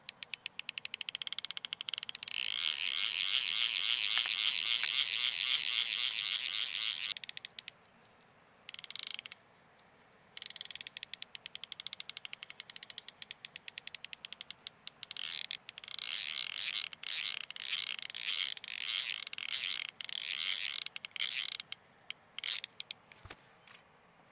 Fly Fishing Winding In Reel Fast Slow

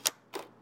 Hotel Card Key

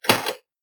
Tape Open

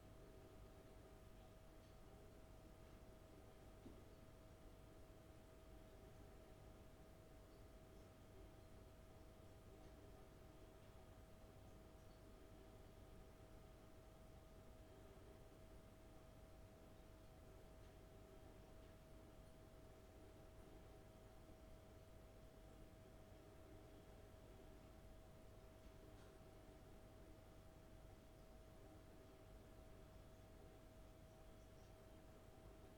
Kitchen Room Tone

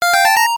Video Game Gain Xp Level Up

